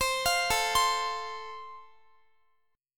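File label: A Minor Sharp 5th, Guitar, chord strum; arpeggiated; Am#5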